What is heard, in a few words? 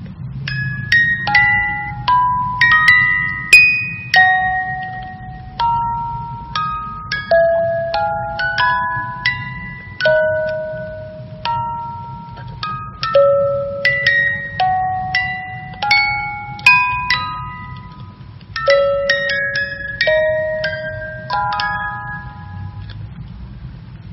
Music Box; جعبه موزیکال باز شونده هندلی با طرح کاش